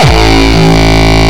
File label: Longkick, kicks